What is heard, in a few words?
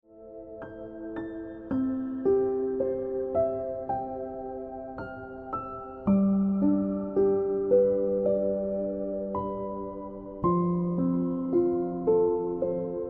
Ringtone Piano Music